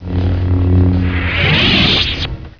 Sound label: lightsaber off; star wars